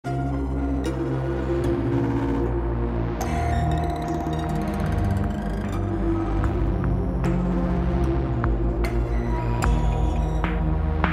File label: robot electro mood, dramatic